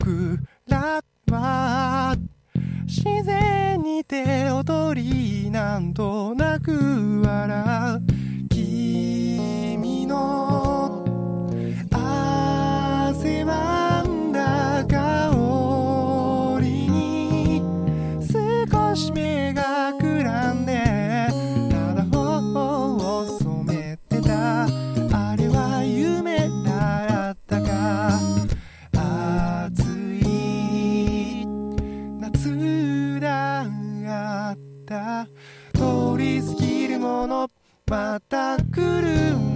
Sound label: guitar